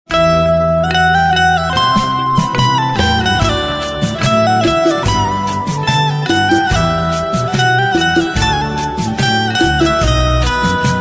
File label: Banjo Instrumental Ringtone